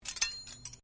cutlery general movement, Household cutlery; Kitchen Sound Effects; Kitchen (Sound Effects:Household)

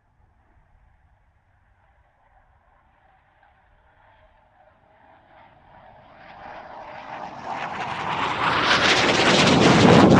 BAE Hawk 127 pass; Airplanes; Vehicles

